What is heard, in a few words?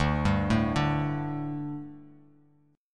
D Suspended 4th down Bass Standard; arpeggiated